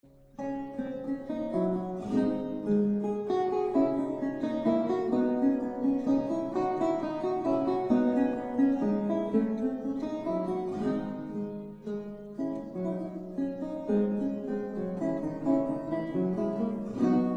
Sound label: Guitar Italian